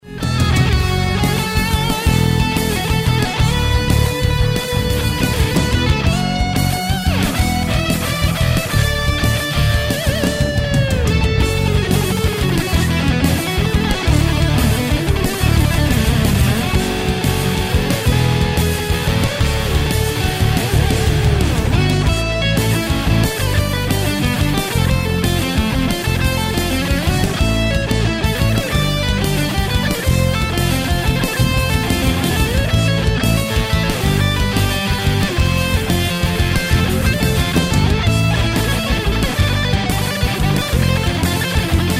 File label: solo jam